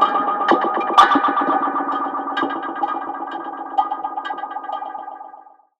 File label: Synth Wah & Tape Delay, contact mic, Tin Of Guitar Strings; musicradar; contact mic madness